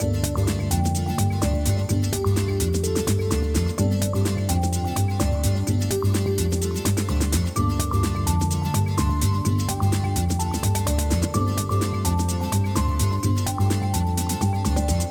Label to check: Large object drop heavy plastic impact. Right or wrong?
Wrong